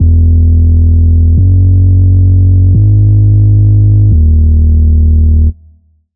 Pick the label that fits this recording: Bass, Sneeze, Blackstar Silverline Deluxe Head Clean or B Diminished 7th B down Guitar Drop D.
Bass